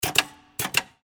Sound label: automatic switch